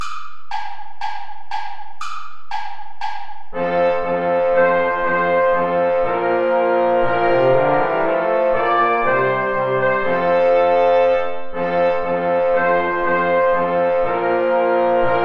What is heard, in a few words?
mit lieb bin ich umfangen play along without trumpet; steuerlein johann